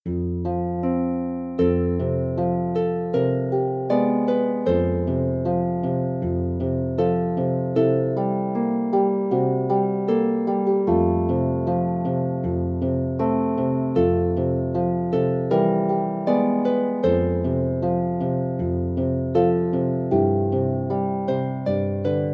Irish Traditional Tunes, harp; Top Ten Irish Traditional Tunes

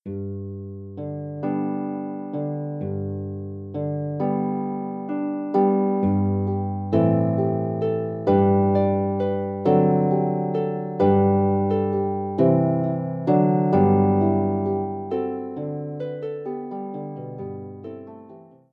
Scottish Traditional Tunes, harp; Top Ten Scottish Traditional Tunes

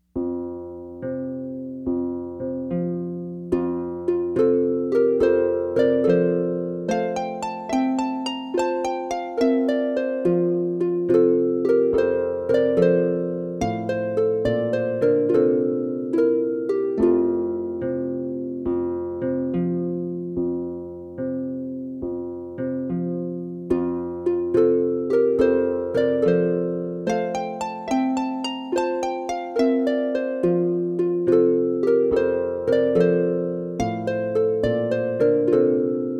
Anglaise, solo lever or pedal harp

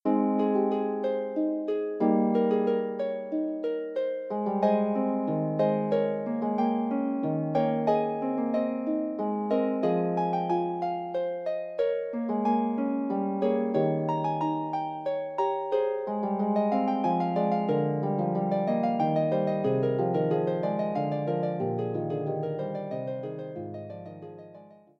prelude, Harp

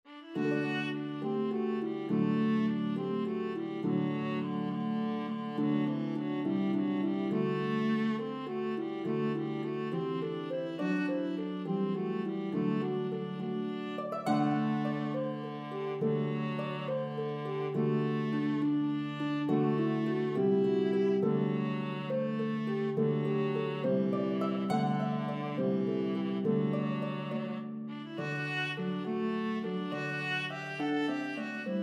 Harp and Melody Instrument in C Viola; Banish Misfortune

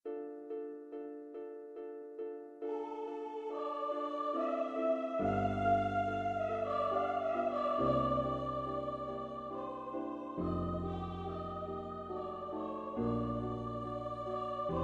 Voice and Harp

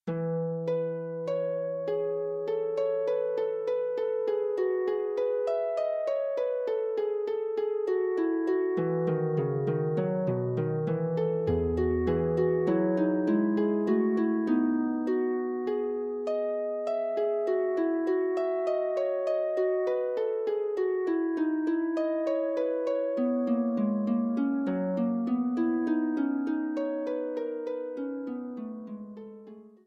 Prelude, harp

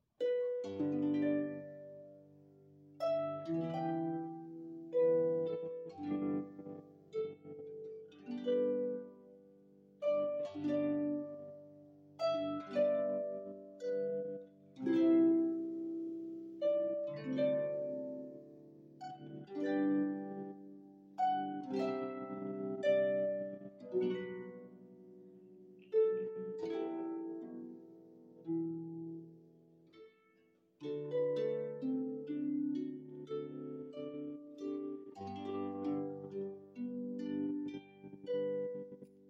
Harp Lever

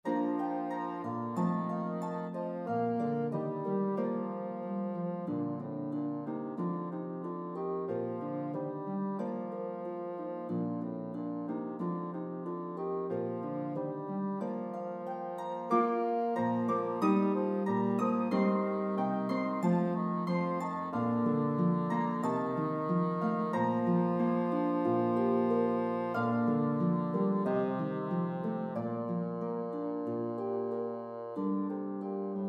Harp Melody Instrument Bassoon

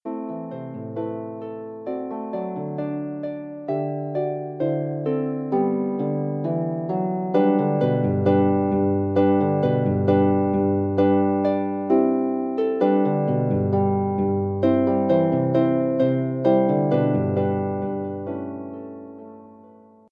Jingle Bells

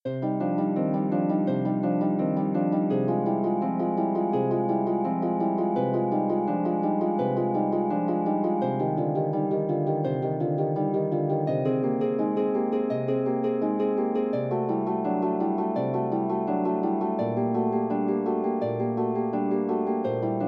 prelude, Harp; Forty Eight Preludes and Fugues Book 1; prelude fugue no 2 in c minor

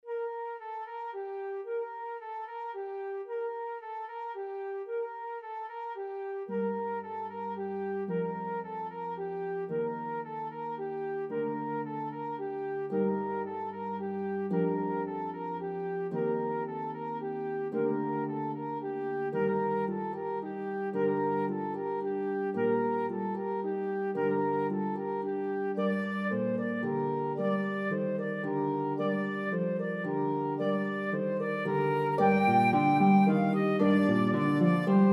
Flute and Pedal Harp

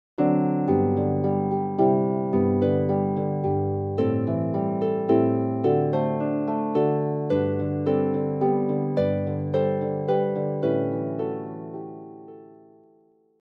Harp; Famous Music for the Harp Vol 6

